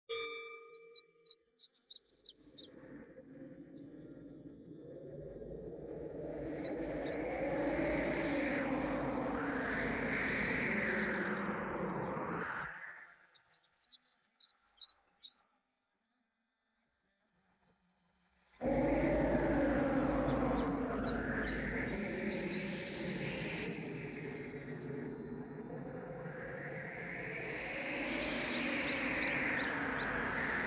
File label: backing track